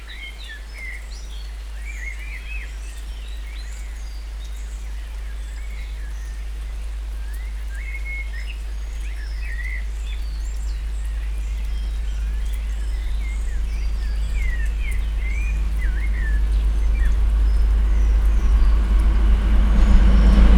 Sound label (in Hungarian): nagy vonat, vonat kívülről; belavar felol; vizhaz; cardioid